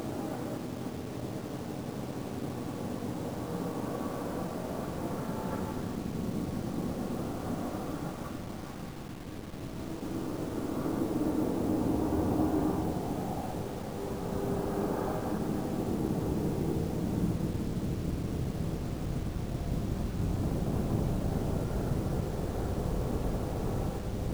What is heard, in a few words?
dark monsters; svencoop